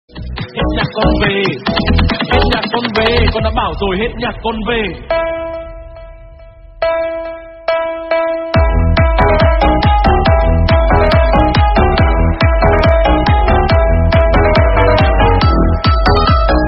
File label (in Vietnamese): Nhac chuong remix; TikTok